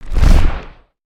Wing Flap; creatures organic

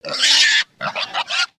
Pets Warthog Ecstatic; creatures; Lego

